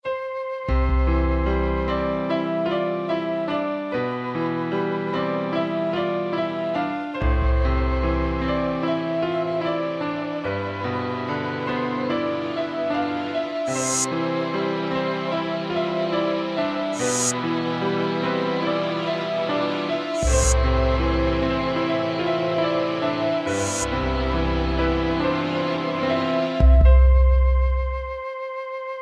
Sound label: Intro To Soft Song